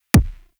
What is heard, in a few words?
Weapon Punch RPG